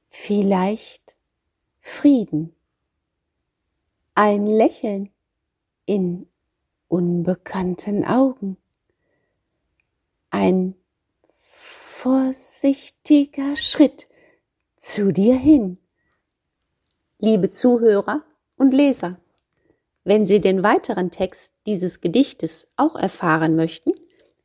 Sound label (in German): vielleicht frieden vox